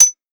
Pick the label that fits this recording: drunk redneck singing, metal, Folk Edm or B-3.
metal